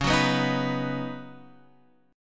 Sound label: C sharp 7th Suspended 2nd Suspended 4th C sharp down Guitar Half Step Down; C#7sus2sus4